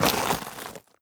step light, footsteps player debris

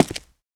concrete, footsteps

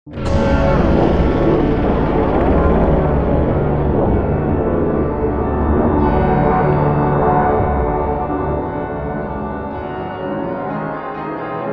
vault hallway; Half Life Alyx; music

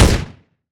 lfe pistol shot, dry; weapons; Half Life Alyx

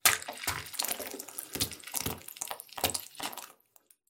xen dripping; ambient; Half Life Alyx

